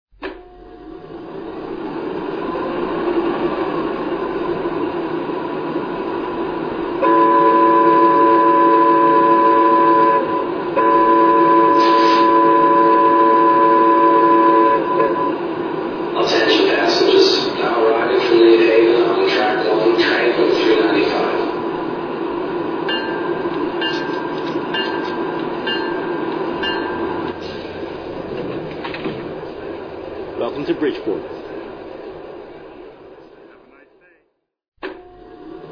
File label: EP5